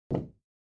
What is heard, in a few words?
Free Weights Exerci